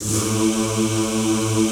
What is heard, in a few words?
ZZZ A1, Classical Choir; Best Service; Extended Classical Choir